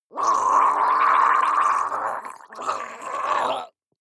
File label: water monster female gargle angry; koerpergeraeusche; comedy-cartoon; soundpool